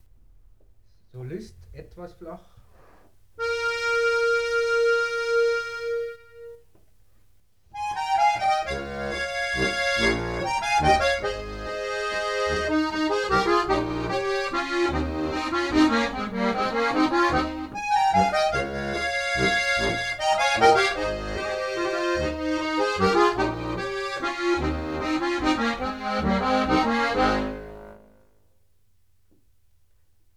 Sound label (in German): Tremolos, Solist etwas flach